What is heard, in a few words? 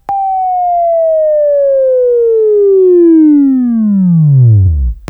WAV Right, Sweeping; Sweeping Training program